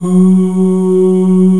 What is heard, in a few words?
F#3 male